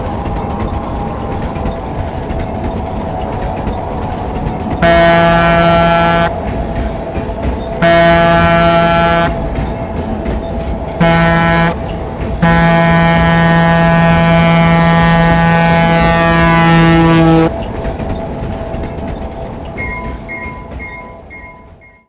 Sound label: GG-1 horn grade crossing, Trains; St Maarten Trains